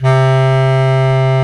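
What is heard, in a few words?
Bass Clarinet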